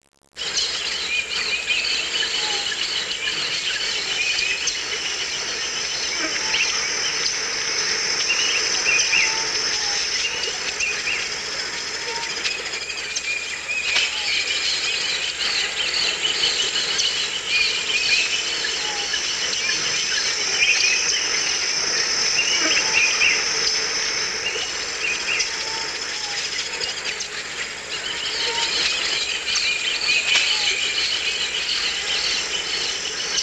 midday, forest

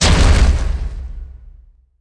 impac ball

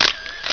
shut

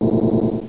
Ship appears; Bedlam 2

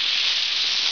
fuses; TNPDEMO

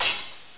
top cymbal; fmsx 2.2; emulation

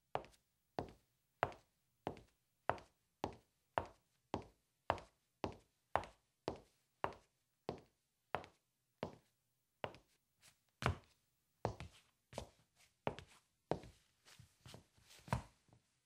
heels; WMBonusXP

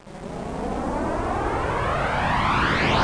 plane; My Animated Word Book; move

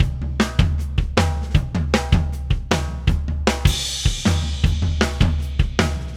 Metal 153bpm, Rock Drums; ACID MUSIC

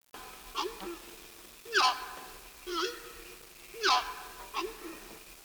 exotic, Birds of North America

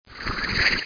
SHUFFLE; MAGIC; DuelSounds

